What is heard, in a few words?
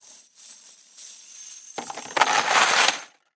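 chain sounds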